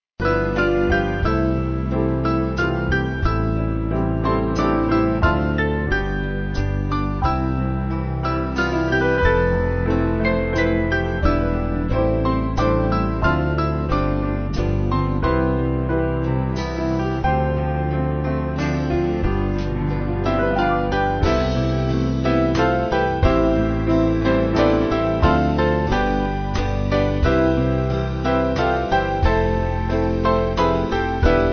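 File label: Band, Music Backing Files for Churches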